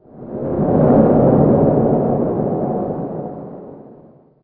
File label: Ambient